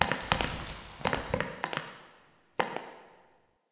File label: Idle Head Shake Vigorous; Tagfer; Warframe; Ambience; Entrati